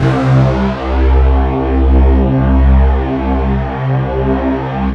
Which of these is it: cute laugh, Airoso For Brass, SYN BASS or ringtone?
SYN BASS